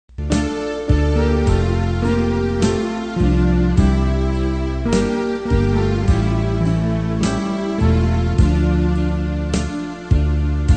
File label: BASS